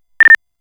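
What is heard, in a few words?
push-to-talk chirp; Samsung SGH-A847 Rugby II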